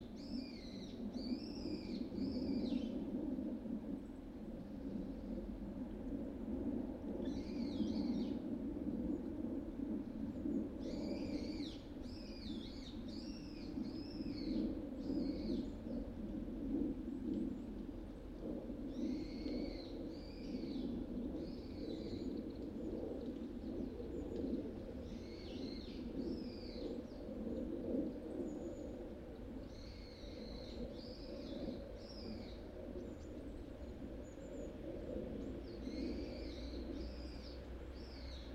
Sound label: yamanakako sound